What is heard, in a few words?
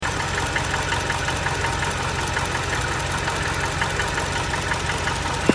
quiet engine knock knocking; discovery ii